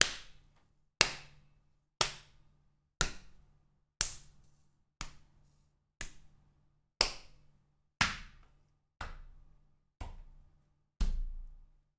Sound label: Impact Smack Body